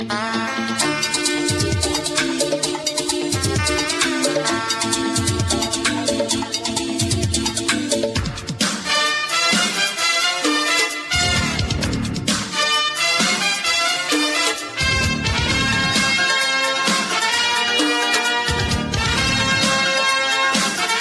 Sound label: hot instrumental, electronic ringtones